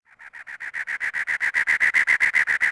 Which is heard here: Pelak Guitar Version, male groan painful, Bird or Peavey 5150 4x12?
Bird